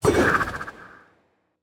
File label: riptide, trident; Minecraft